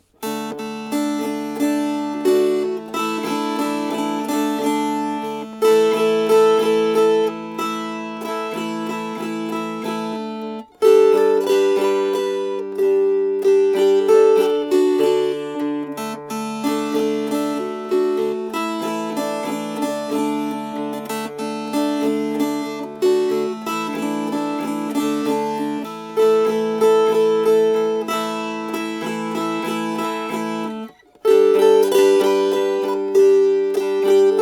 Strummed

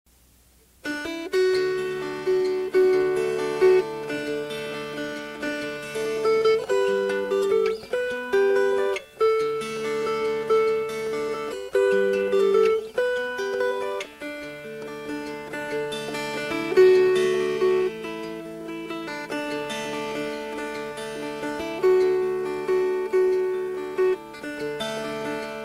Young Charlotte Fingerpicked

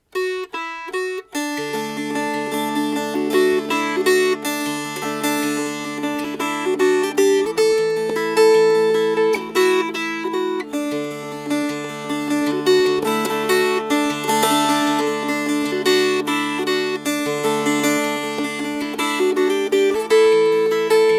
Fingerpicked

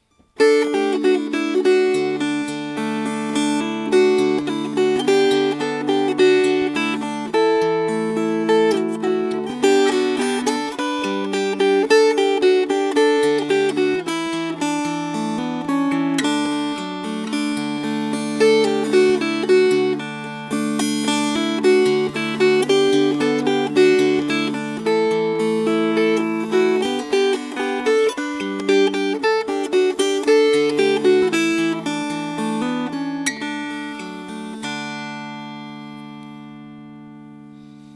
Fingerpicked

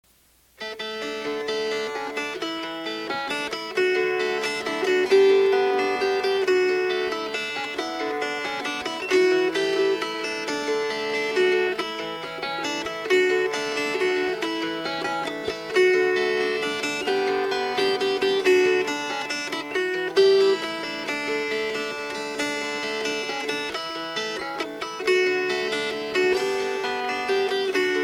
Fingerpicked; Morning Song; Mananitas

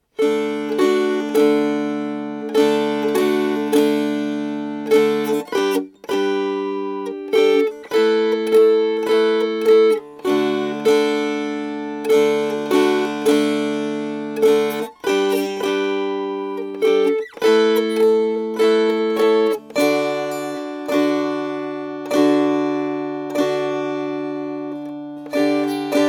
Rise and Shine Strummed

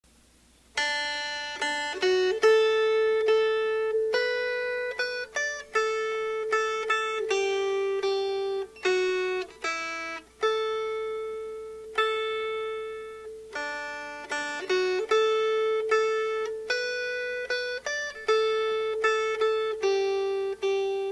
Melody

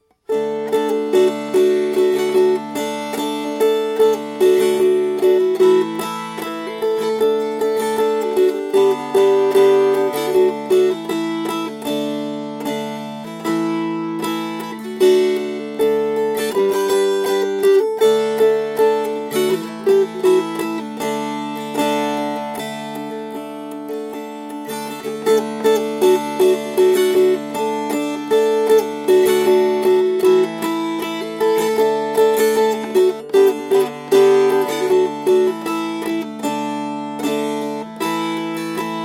Strummed

